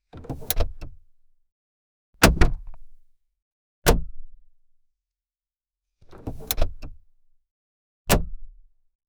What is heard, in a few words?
doors